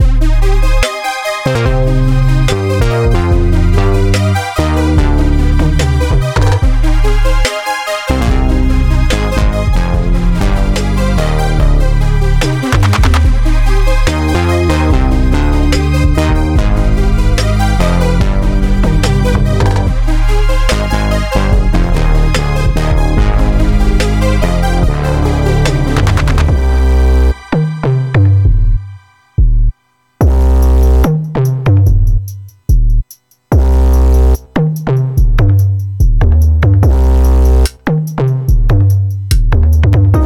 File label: craptastic synth bass; weeklybeats 2026